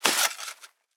hoe till; item; Minecraft; Votive World

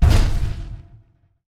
walk, iron golem; mob; Minecraft; Conquest Default

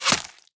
bow hit; Minecraft; Conquest Default

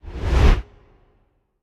mirror move, illusion illager; mob; Minecraft; Conquest Default

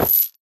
equip chain, armor; item; Minecraft; Conquest Default

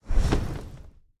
wings, enderdragon; mob; Minecraft; Votive World

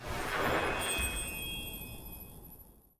endereye launch; Minecraft; Conquest Default

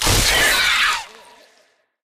death, phantom; mob; Minecraft; Votive World

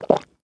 burp; Minecraft; Conquest Default

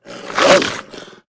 wolf growl; mob; Minecraft; Votive World

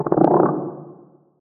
ambient, guardian; Minecraft; Conquest Default

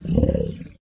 land idle, guardian, mob; Minecraft; Votive World

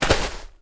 sand, step; Minecraft; Conquest Default

